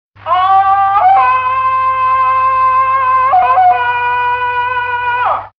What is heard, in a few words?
Crying; Tarzan